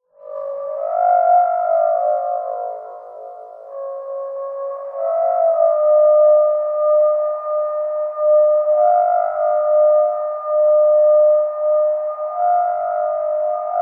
science fiction horn weird alien distant musical horn blow; zapsplat